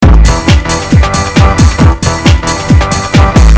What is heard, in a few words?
Loop